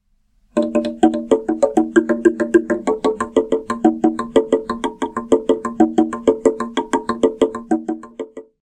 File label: Log Drum Olivier Chene